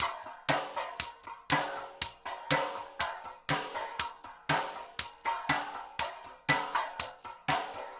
DISCRETE DRUMS

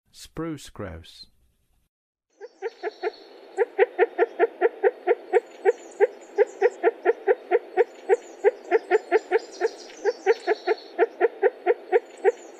Spruce Grouse; North America

